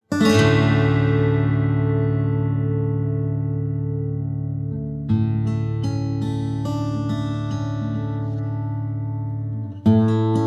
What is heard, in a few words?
Acoustic Vibes